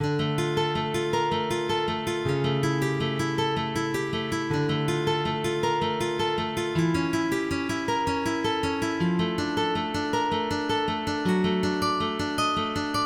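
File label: Prélude en ré mineur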